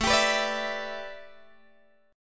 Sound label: A 7th Suspended 2nd Suspended 4th A, Guitar Open G; chord